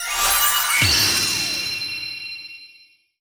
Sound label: Grade Up; Disney Star Smash; Android, iOS, Mobile